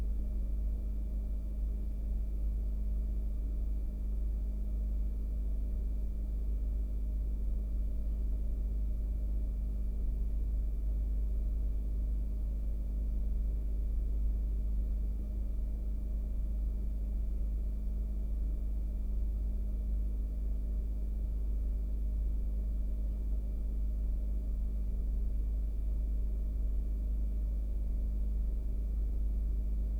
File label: Fridge, Background Noise; HomeLike; Fridge DUT1 AC2